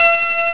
Synth Bell; ST-XX Amiga Soundtracker Sample Packs

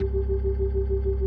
C1F, B3, Vintage Keyboards

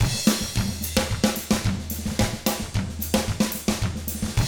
110 SMBA samba; ILIO; Double Platinum Drums 1

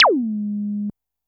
korg trident

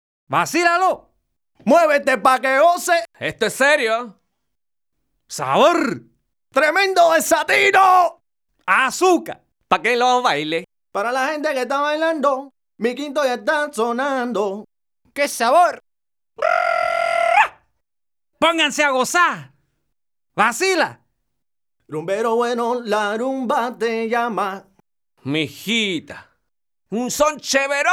Salsa Picante; Masterbits; World Zone VOL-3

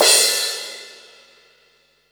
crash L; Zero-G; Chemical Beats; CRASH ZOO

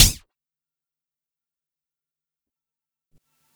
Quasimidi 309, Drums

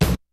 KICK SNARE; Kid Nepro; Elite; KICKS SNARES

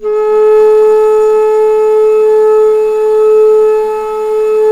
BASS A2, BASSFLUTE; Historical Instruments; AKAI; USB Soundscan vol.23

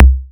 FM KICK, BASSDRUM; Drum Hits

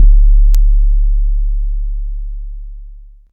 Bom, Kik, One Shots; Sonic Foundry (Sony Creative Software) - Future Retro Dance Excursions

